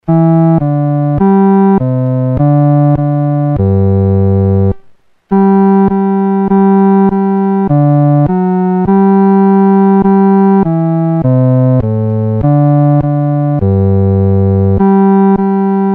独奏 第四声

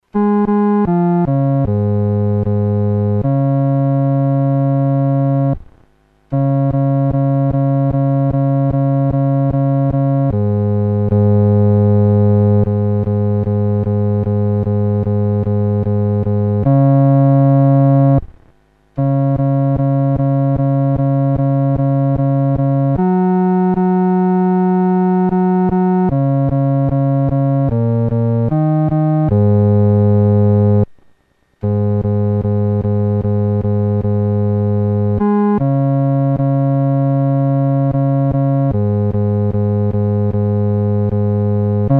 独奏 第四声

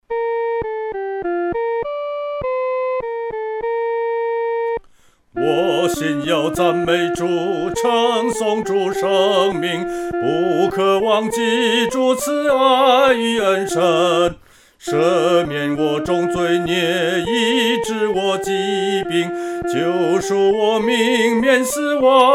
独唱 第一声; 赞美主恩

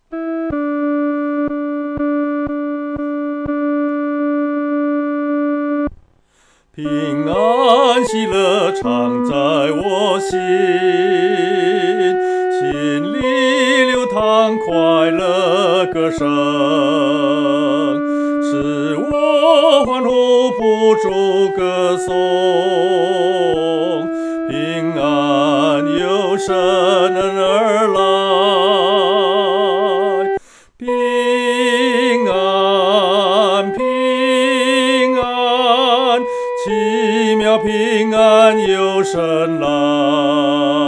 独唱 第一声; 奇妙平安由神来

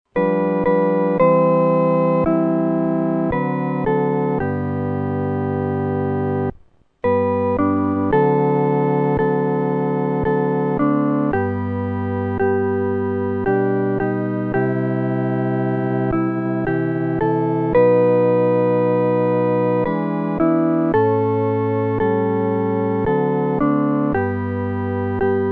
合奏 四声部

